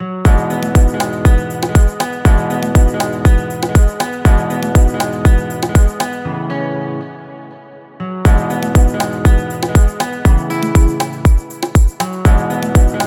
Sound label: Dance Alarm